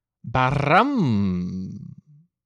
Ba-RUM; Foley; Secretly Incredibly Fascinating

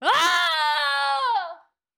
Wilhelm Scream 2.0; Foley Library; Danger Sounds; Let's Learn Everything!

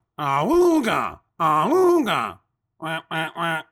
Ahoogah; Foley; Judge John Hodgman

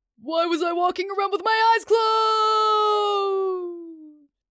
Person falling down a well; Foley Library; People Sounds

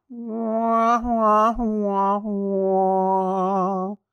Trombone, sad; Foley; Judge John Hodgman

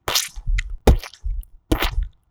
Squelch, messy; Foley; Judge John Hodgman

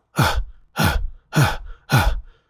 Efforts, People Sounds; Foley Library; Judge John Hodgman

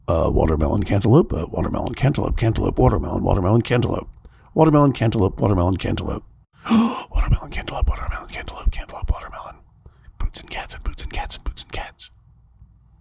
Crowd noises, staggered; Judge John Hodgman

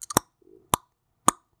Ticking clock; Foley; Judge John Hodgman

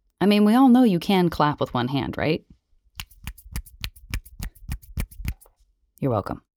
One hand clapping; Foley

